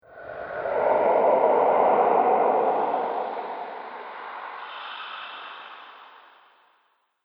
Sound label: Gruesome Ghost Monster Discharge; Ghost Sound Effect - Sinister, Scary, Spooky Or Just Silly; Haunted House